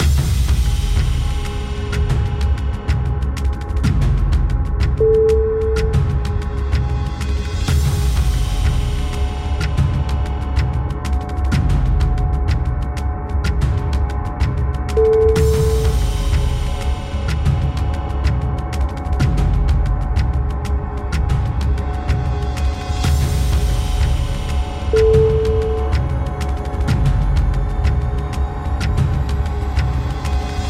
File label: the countdown